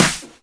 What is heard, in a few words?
Snares; Vengeance - Essential Clubsounds Vol.4